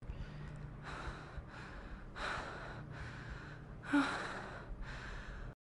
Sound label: natural respirations woman; SFX; DailySounds